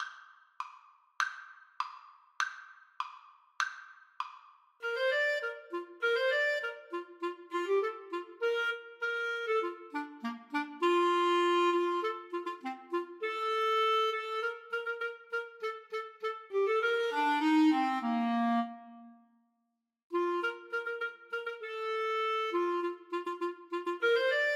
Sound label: Clarinet Duet